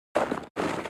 snowball reload

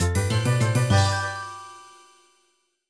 Jingle Photo Reward

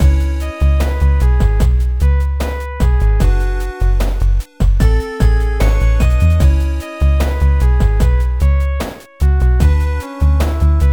Mountain Cave Loop; ravva and the phantom library

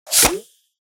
hand launch; Poppy Playtime; Extendo Hands

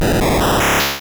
Outrage Start; Pokemon; Gen 2; attack moves; GSC

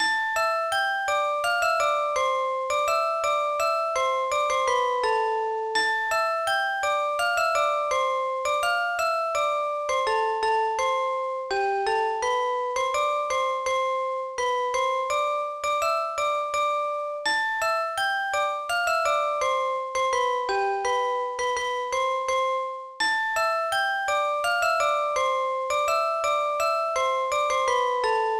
Music Box

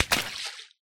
water splash small item

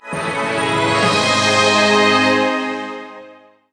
Stage Cleared!, Jingle; Battleground Z; 3DS

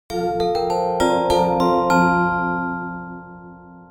Jingle Failure; Travel Adventures with Hello Kitty; 3DS

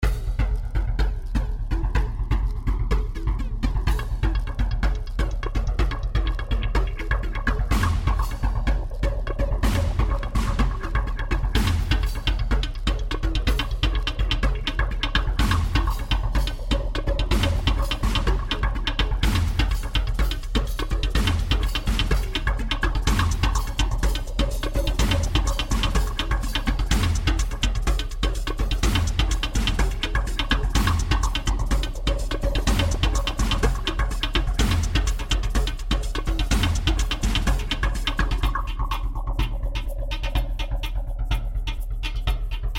action music ice action 125bpm

